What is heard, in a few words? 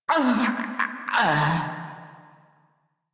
Death Male; Railjack Crew; Perrin Sequence